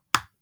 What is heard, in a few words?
Single Chocolate Bar Break